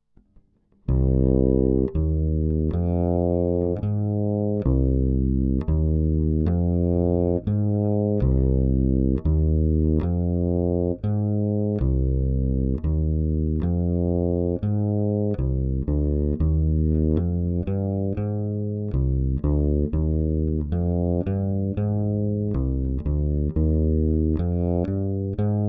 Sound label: thinline solo, sandberg; instrumente